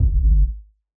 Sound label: sub shockwave; soundscrate